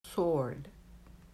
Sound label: sword